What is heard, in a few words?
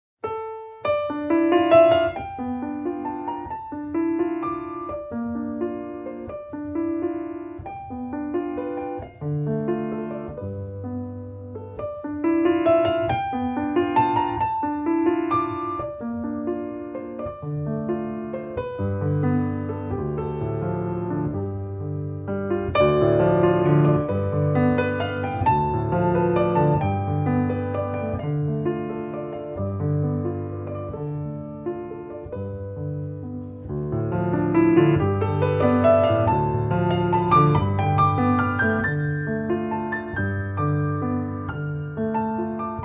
Instrumental